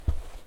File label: Carpet Foot Step